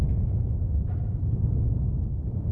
volcano; The Elder Scrolls III - Morrowind; Morrowind GOTY GOG

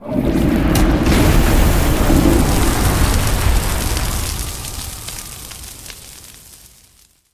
Gold Dragon Breath Weapon Fire; SyrinScape; Vault of Dragons

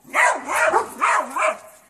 Riding Dogs; SyrinScape SoundsScape Collection; bbh Haunting part 1; TTRPG

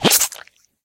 Ooze Attack; SyrinScape; Missing Magistrate

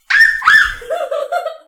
giggles and laughter; SyrinScape

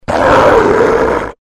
scrm

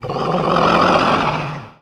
moan

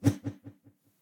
slashes; SyrinScape; Temple of Urgathoa

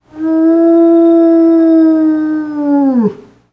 wolf howl; The Elder Scrolls III - Morrowind; were

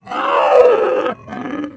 moan; The Elder Scrolls III - Morrowind

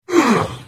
Hit; The Elder Scrolls III - Morrowind

